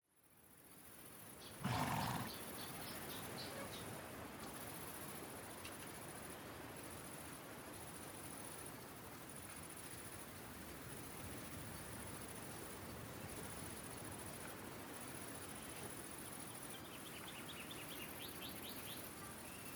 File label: Ambience; Microsoft Studios; Age of Empires III - Complete Collection; cinematics